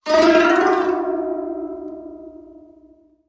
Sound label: Easy Pluck